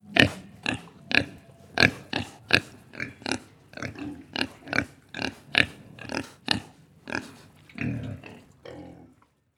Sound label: pig